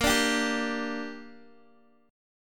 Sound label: Bb 6th, Guitar, Open D; x,13,12,13,10,x